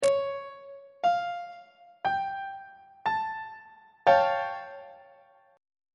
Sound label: g double sharp augmented 7th 1st inversion on treble clef